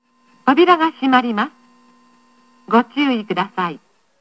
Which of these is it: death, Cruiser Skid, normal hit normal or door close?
door close